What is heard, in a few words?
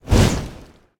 phoenix fire; smite; npc